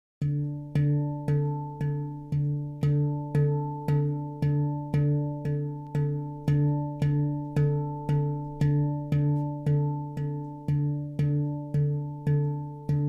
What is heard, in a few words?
Ding Overtones